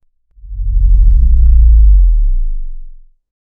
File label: focus sound, countdown